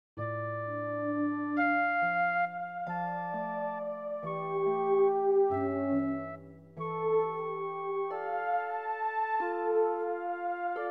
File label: la folia chords